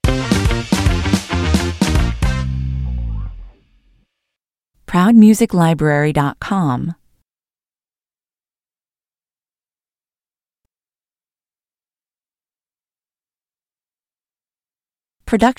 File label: funky stinger